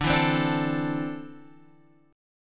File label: D 7th Suspended 2nd down, chord; D7sus2; strummed